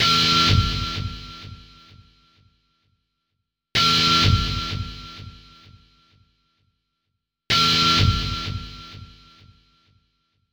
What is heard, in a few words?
Headshot Delay; Orcs Must Die